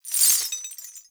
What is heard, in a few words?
Movement Dash Chains; Warframe; Uriel